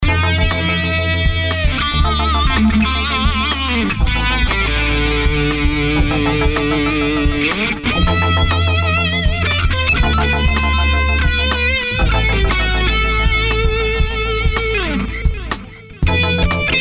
essai bb gros son basse; m-audio blackbox

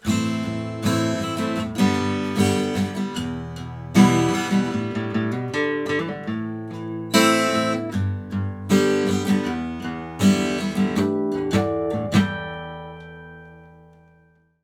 rythmique mediator; parker pa22

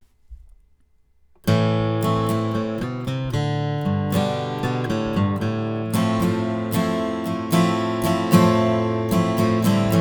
guitare prise; prodipe; lamp studio pro; sputnik

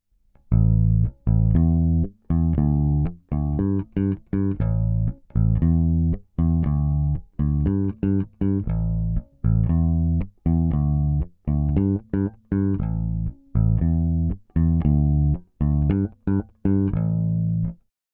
compresseur rapide gain normal doigts, bass; smpro

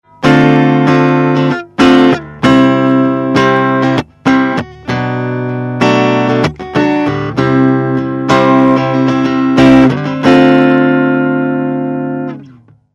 tele clair manche vol5; fender champion600

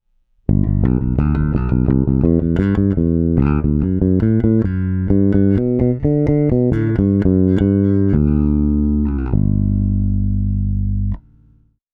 basse compresseur; rupert neve portico

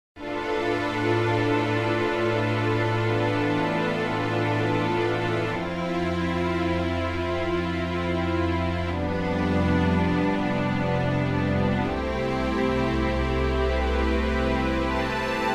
cordes; eastwest colossus

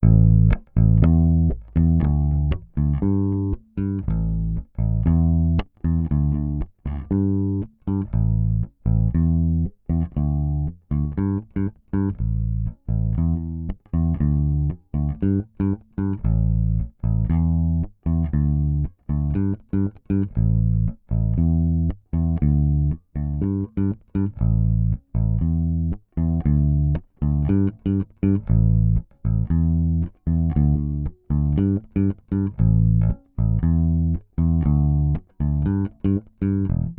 reglage plat doigts, Bass; smpro

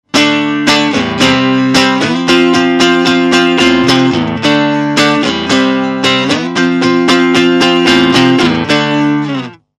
strat crunch manche vol 6; fender champion600

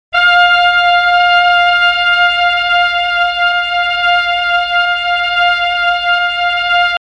bouclage; ik multimedia sampletron

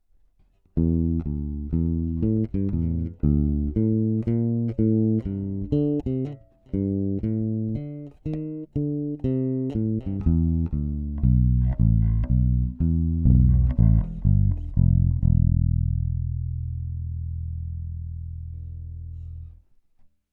lexicon fw810s basse dry; lexicon ionix

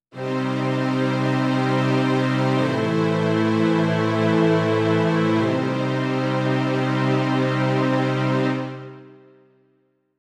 son strings, korg m50

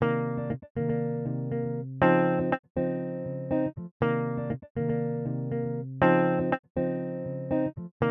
guitare funk; eastwest colossus

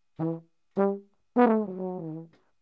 baryton; audiotechnica ae2500

